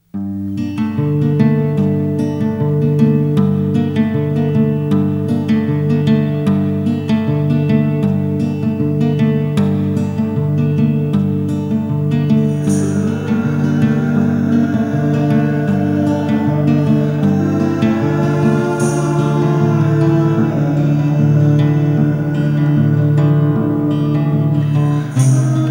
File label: Instrumental